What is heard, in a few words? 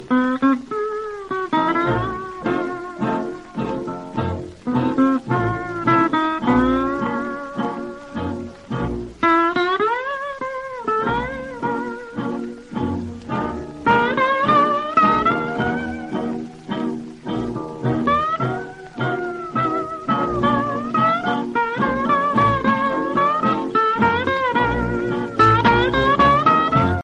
polish tango